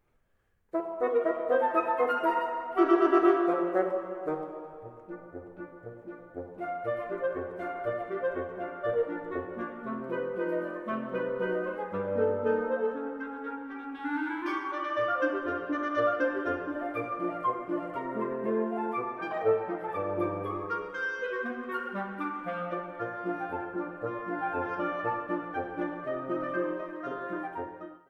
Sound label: Polka; D. Shostakovich